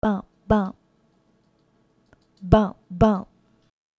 Vocal, Infinite Soul; Sonic Foundry (Sony Creative Software); Vocals

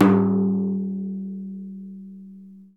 deff open; Deff Bendir &Req

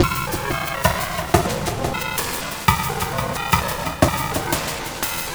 Drum Fx Loop, Electronica

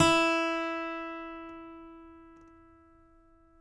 ACGT E4, ACCGTR PICK

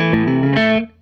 Gretsch Guitar Loop

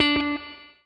ROCK, Guitar

